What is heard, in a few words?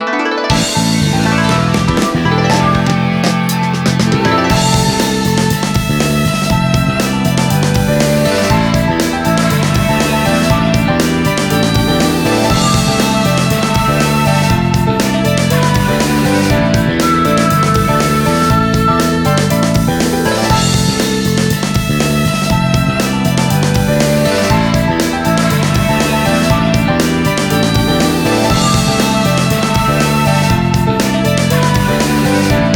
Battle Victory; Naruto Shippuden: Ultimate Ninja Storm Revolution